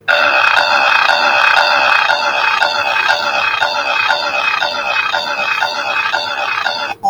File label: grumble burp; barney